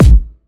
bass drum single shot A key, steel kick drum sample tuned to the A note; kick drum samples